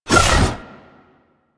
Glaive Whoosh; Warframe; Weapons; Ghost Catcher

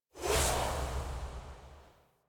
UI Popup Out; Nemesis; Warframe; Kuva Lich

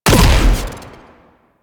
Grenade Launcher; Warframe; Thano Tech

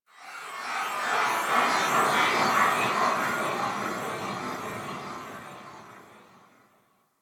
Chime Texture; Warframe; The New War; Zariman; Apartment

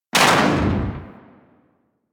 Briefcase Launcher Shot C, Weapons; Warframe; Corpus

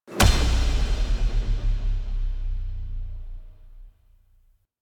Queens Lair Operator Scepter Slam; Warframe; The War Within

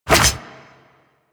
Melee Block Start; Warframe; Wraith

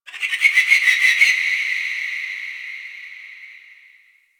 Ocean Underwater Creatures Low Medium; Ambience; Warframe; Grineer Ocean

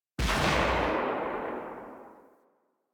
Protea SMG Tail A

